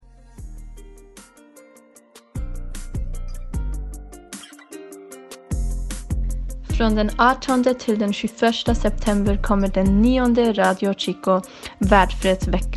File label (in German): Jingle Schwedisch